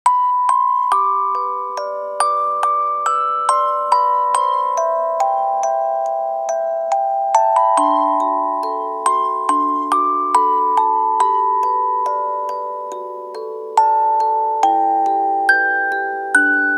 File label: Orgel; fortuna rhapsody original soundtrack